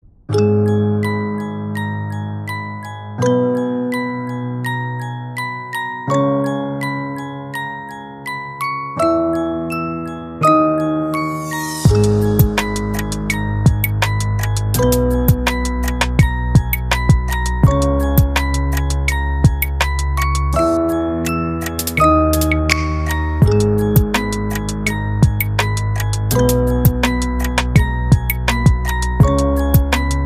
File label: instrumental ringtone